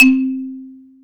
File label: XYLO C 2; AKAI S-Series CD-ROM Sound Library VOL-7